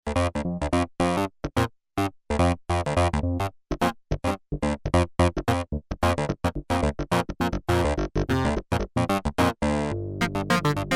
CLAVINET, Korg DW-8000; Factory Patches

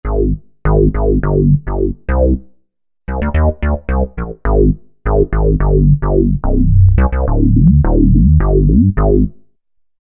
Solid Bass, Roland V-Synth; Factory Patches

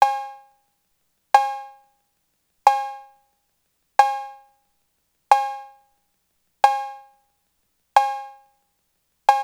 TR-808 Cowbell; Roland; Instrument samples

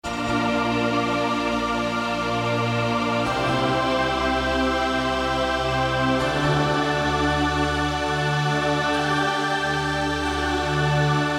Silky Pad, Generalmusic Equinox

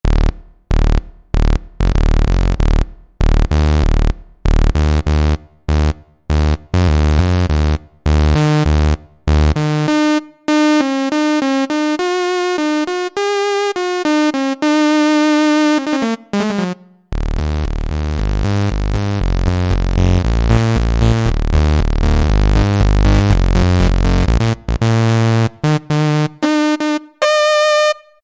Raw SAW; Roland SR-JV80-11 Techno Collection

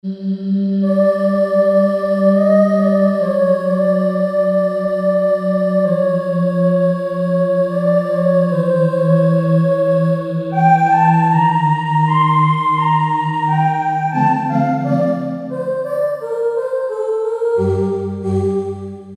Synth Vox, Roland U-220; Factory Patches

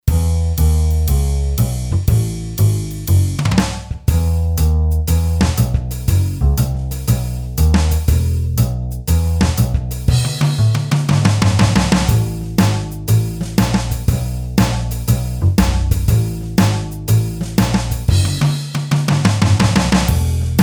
MED BLUES, Boss DR-3

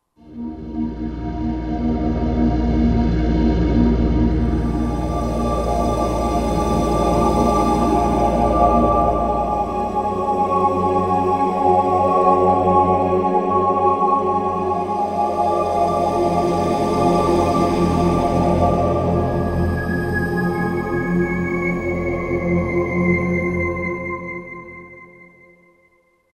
Mysteriouso; Roland SR-JV80-09 Session

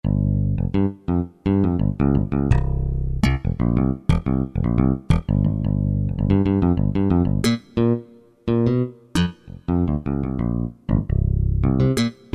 Dual Bass, Kurzweil K2000; Kurzweil K2000 V3

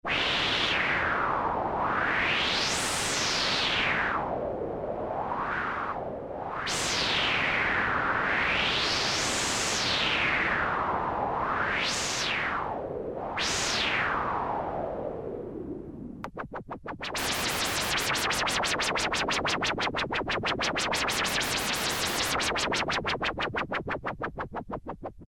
white noise, Korg M-500 micro-preset

